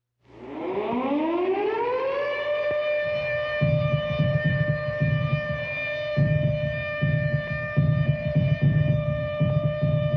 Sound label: Anti-Aircraft With Air Raid Siren